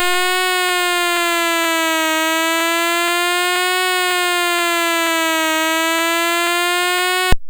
BASIC, MOS6581R2; C Prog Ref Guide example; Commodore

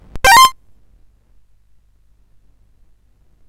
sid CSG8580R5; California Games

